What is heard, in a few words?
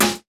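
SNARE, Hip Hop; InVision Interactive; 40 Oz Phat Slammin Hip Hop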